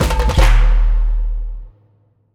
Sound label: win big win loop end super mega; rise of maya